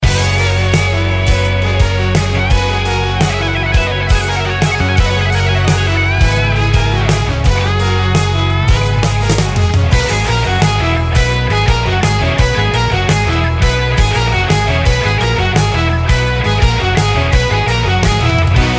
beats

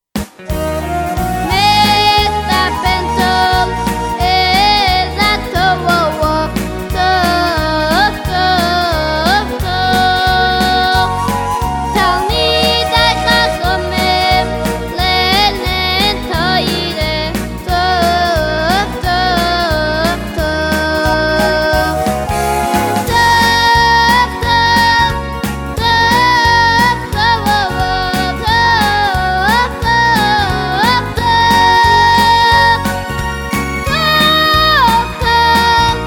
niggun

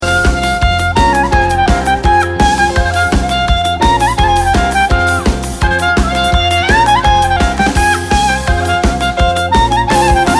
nigun simcha, instrumental

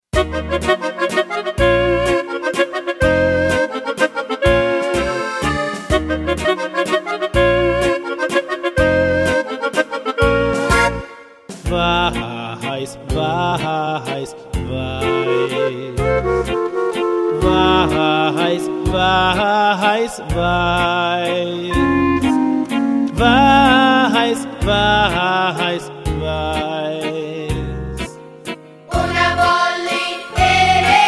niggun

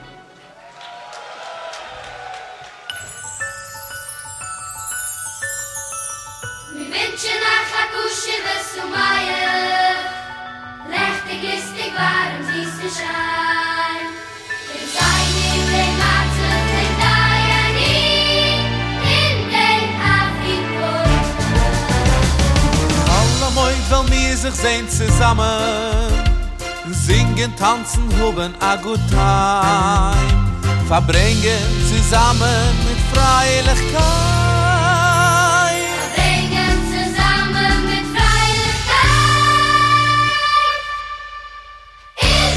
yiddish nachas jingle

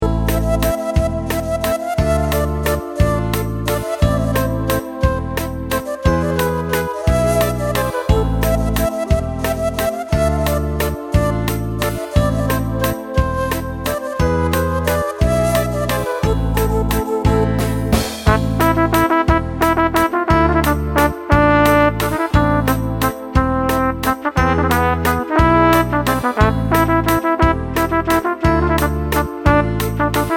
waltz; mrm productions

